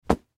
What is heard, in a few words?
Towel Dry Drop, Towel Dry Drop Floor; Household; Bathroom